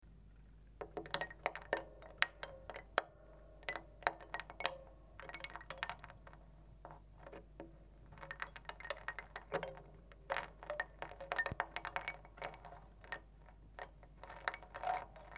peigne, harpe

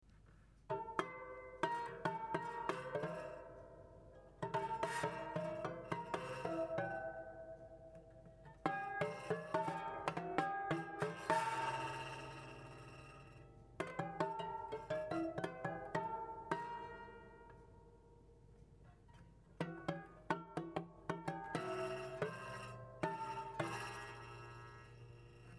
baguet gouttes, touchers de la harpe chinoise

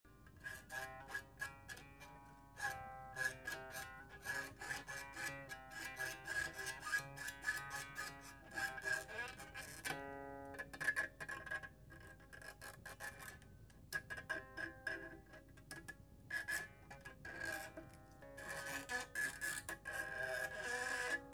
plastique; harpe

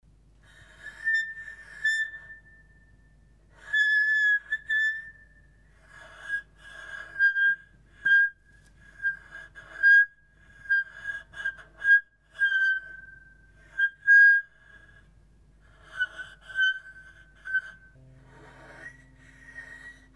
chiffon larsen, harpe

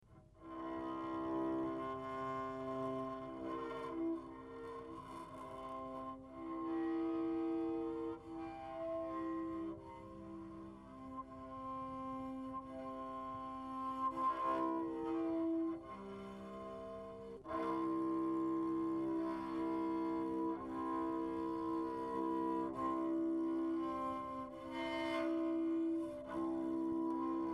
archet harmo, harpe; touchers de la harpe

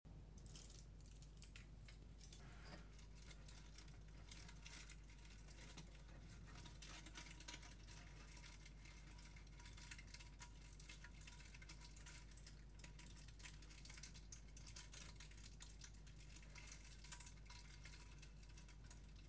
papier

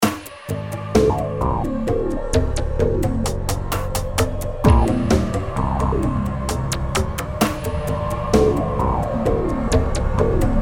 analogues musique fond

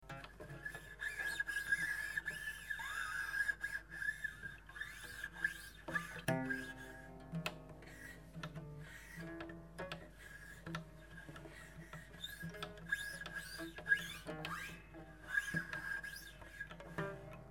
chiffon, leviers, harpe

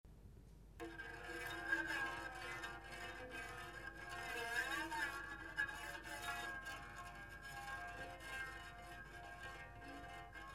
frott, harpe

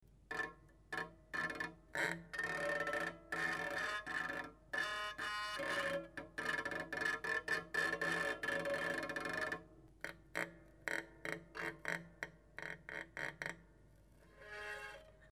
archet grince, harpe; touchers de la harpe

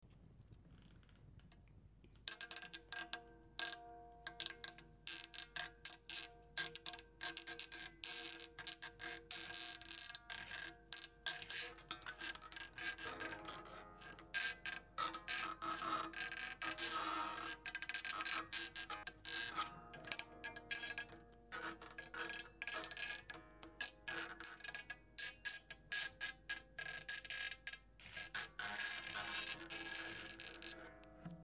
plastique guide crepit, touchers de la harpe

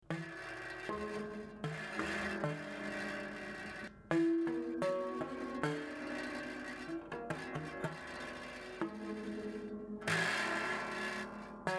tige prepa, touchers de la harpe

